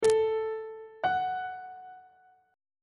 relative minor of a major is f sharp on treble clef